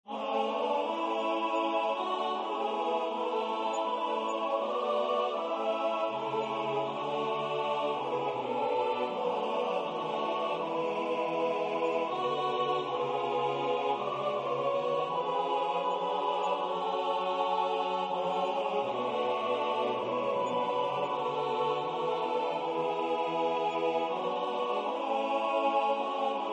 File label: SATB; Lyrisches Intermezzo